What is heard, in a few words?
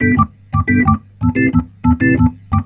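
reggae organ G; Best Service Advanced Media Trax Modern Composer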